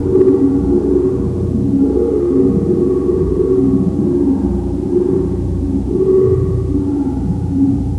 fear; svencoop

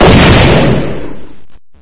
barrel; weapons; svencoop; st mission

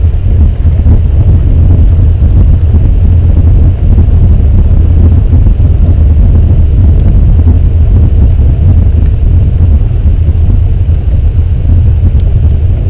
ambience; svencoop; aomdc

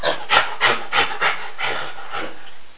headcrab alert; svencoop

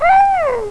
headcrab pain; svencoop; biglolly coop

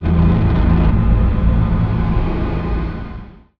game over; svencoop; morass beta

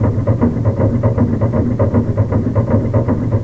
buzzer engine work; svencoop; sence; mistake coop

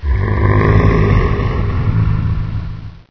pain; svencoop; overlord flame

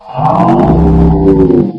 ghost idle; svencoop; custom monsters

